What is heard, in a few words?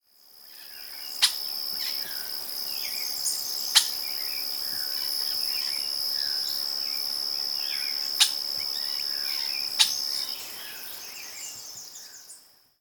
Giant cowbird; پرنده گاو مرغ بزرگ